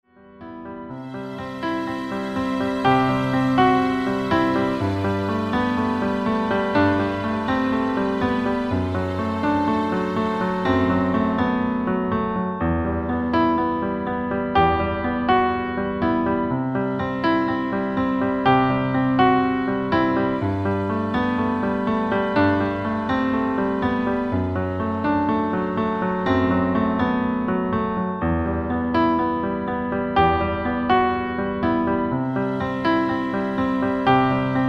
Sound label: Strings; Streicher; Instrumental